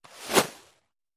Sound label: Arrow Sizzle Bys Heav; bow & arrow bys